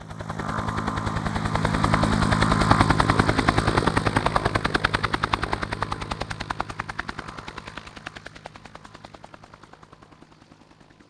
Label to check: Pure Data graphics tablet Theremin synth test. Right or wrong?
Wrong